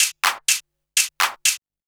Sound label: 124bpm, House Perc Loops; E Lab; XTc Files of House